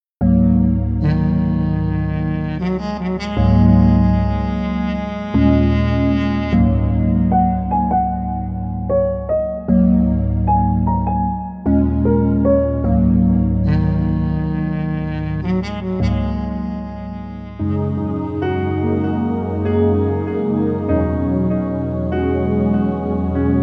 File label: Sad Tranquil; standard pack 2